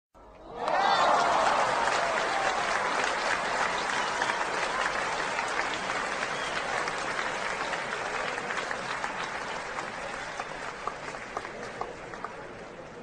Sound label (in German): applaus